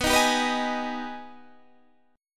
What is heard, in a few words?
Minor Major 7th; Gsharp; B; 19,18,17,16,16,16